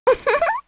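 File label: Vox Laugh, Korean; heroes; Hanus; kingsraid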